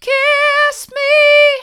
kiss me hard, vocal

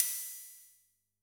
Crash, drum

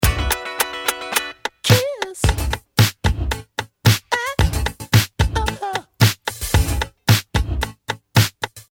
loop mono

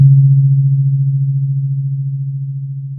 pop, Sound of a church bell

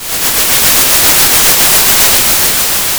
Sound of a thunderstorm

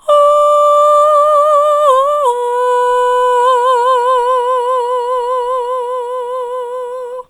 ahh soft, vocal

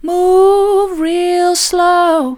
move real slow soft, vocal

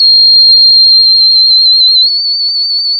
pop, sound of a tea kettle whistling

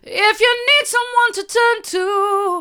mid, vocal

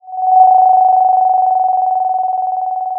Sound of a phone ringing

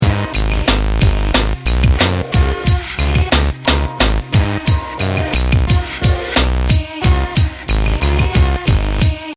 loop

